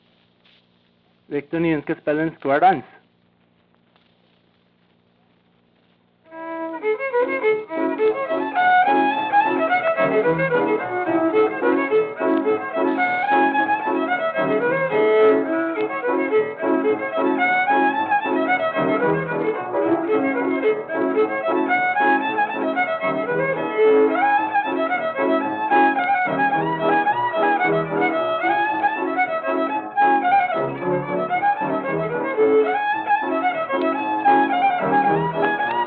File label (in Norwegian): MUSIKK; amerikanorsk; einar haugen